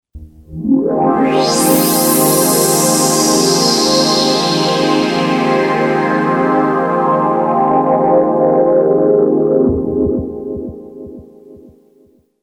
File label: Pad Trans, long; Soundzabound